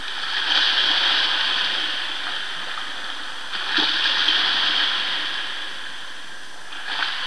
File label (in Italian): risacca